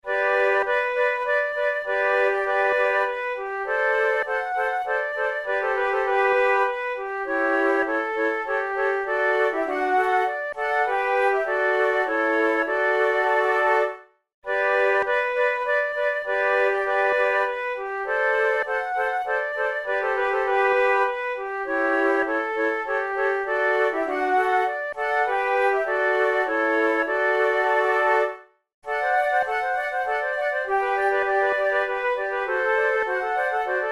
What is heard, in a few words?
minuet duet; Mozart; Don Giovanni